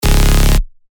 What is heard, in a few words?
C#, bass shots; Fungus; revealed bass shots vol 4